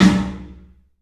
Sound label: PA DEEP R, CLUB SN VRB; 3D Sound; 3D Drums